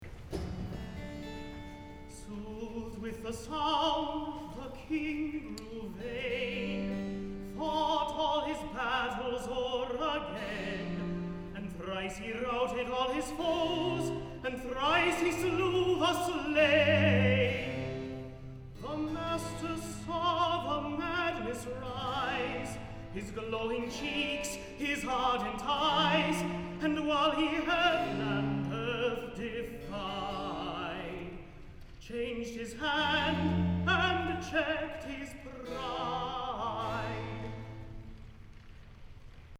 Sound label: Recitative; Sooth'd with the sound